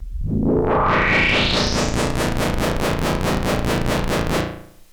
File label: Monotron Sci-Fi